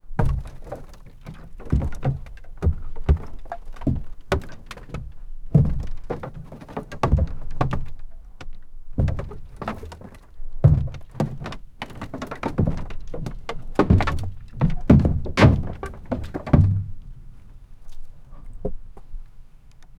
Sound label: emerging from the water a, ship